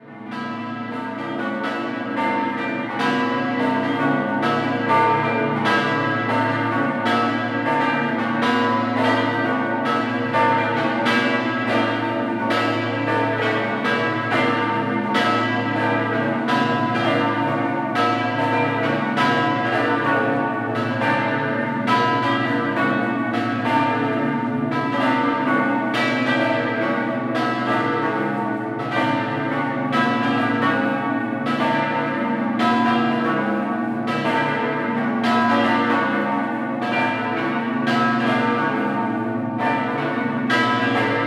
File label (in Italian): Doppio, campane; Mortegliano